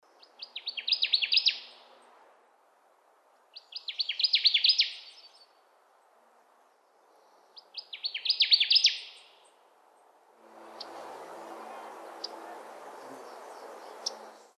WARBLER CONNECTICUT, birds; species/birds; wbba

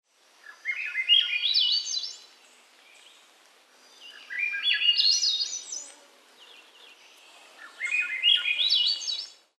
THRUSH SWAINSON S, birds; species/birds; wbba

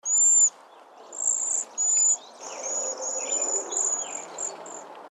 WAXWING CEDAR, birds; species/birds; wbba

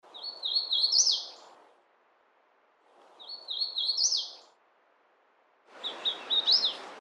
warbler hooded; species/birds; wbba

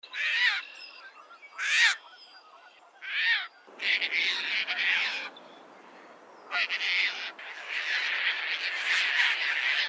TERN CASPIAN, birds; species/birds; wbba

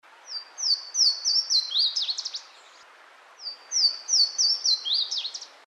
WATERTHRUSH LOUISIANA, birds; species/birds; wbba

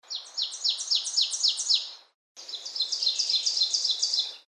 OVENBIRD; species/birds; wbba

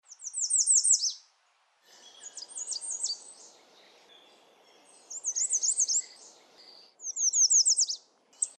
REDSTART AMERICAN; species/birds; wbba

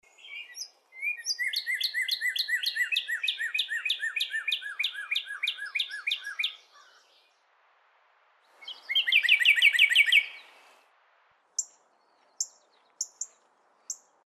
CARDINAL NORTHERN; species/birds; wbba

